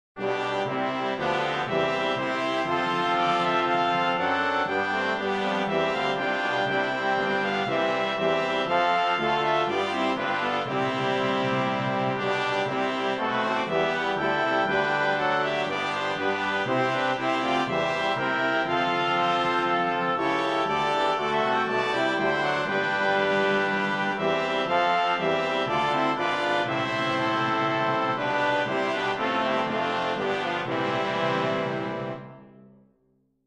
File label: Hymn BRASS